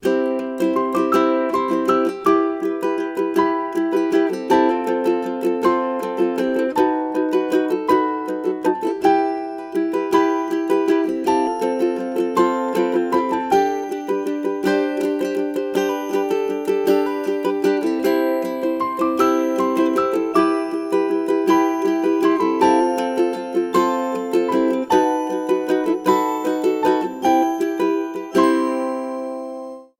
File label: Sweet Uke Song Small